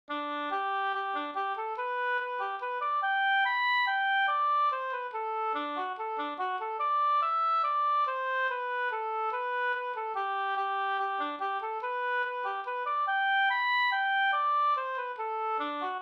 mazurka

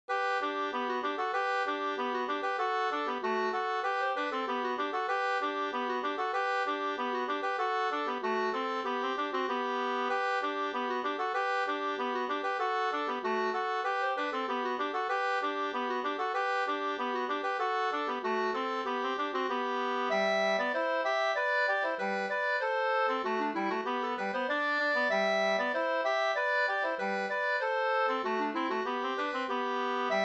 polka polkett; e Edvard Pettersson Sd 2st

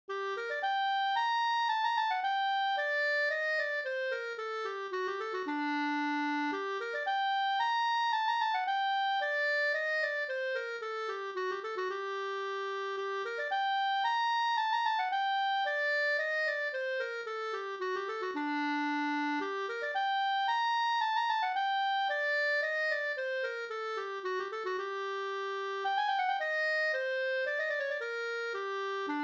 polska Moll

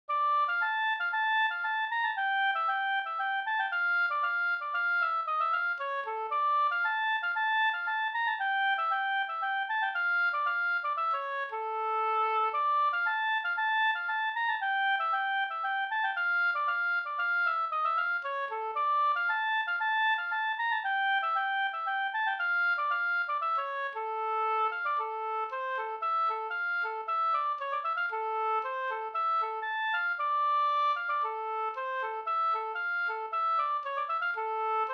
polska Dalpolska

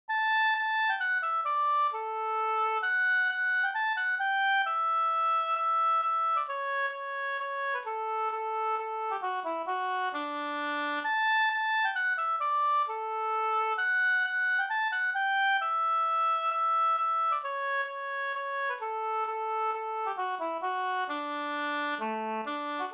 hambopolska

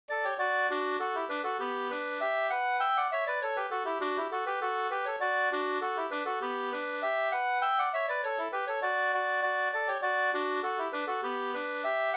engelska

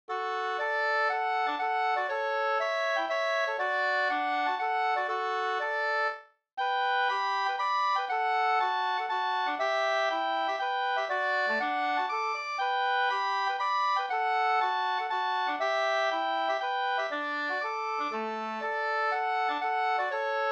polska

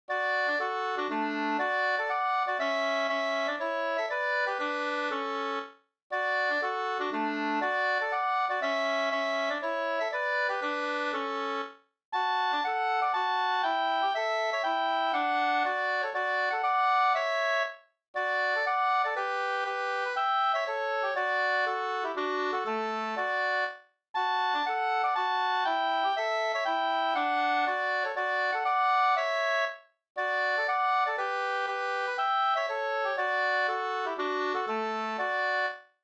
folkdans, Sanglek; Hopp Pelle da fr Sd Rosenberg 1-62 2st

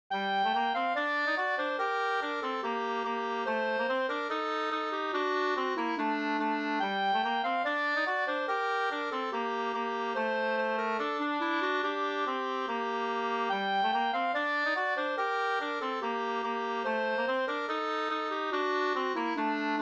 schottis; av Hilmer-Anders 2st

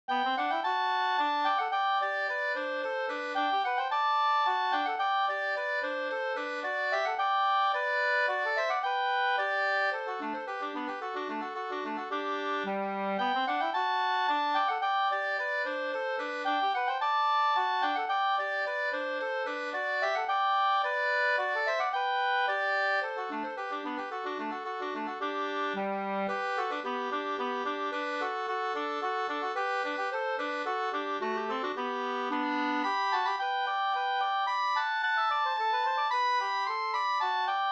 slangpolska, G dur

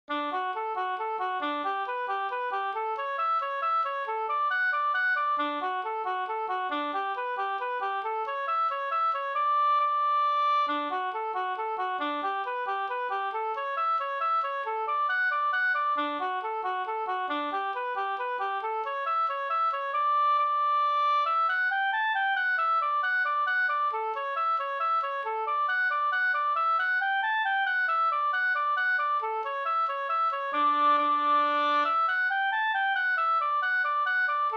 hambopolkett; Taglaten Malm-Lasses ruckare Sd

